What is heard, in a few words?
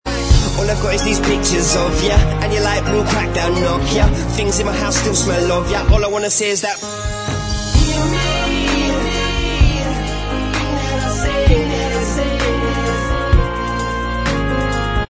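backing vocals Track, backing tracks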